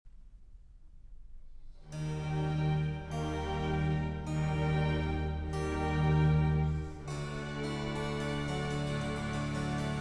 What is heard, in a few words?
Concerto No. 7 in F major for 4 Violin